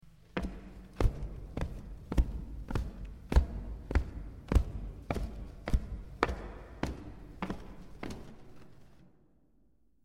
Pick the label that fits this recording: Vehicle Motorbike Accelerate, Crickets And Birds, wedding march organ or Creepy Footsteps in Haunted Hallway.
Creepy Footsteps in Haunted Hallway